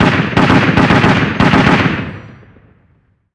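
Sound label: gun 50cal medium; Call of Duty Modern Warfare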